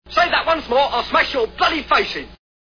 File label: smash; montypython